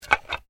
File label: Bones Human Move